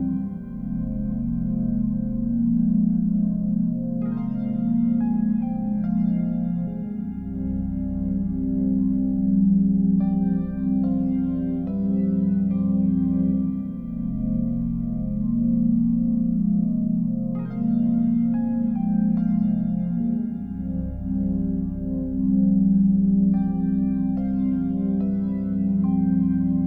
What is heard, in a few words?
ambient; ゆめ2っき